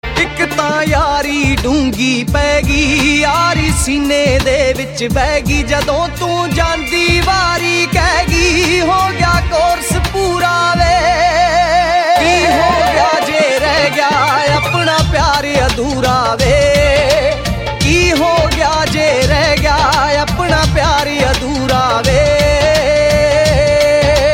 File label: Lovely Sad Song